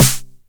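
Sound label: 909 SD Tape, snare drum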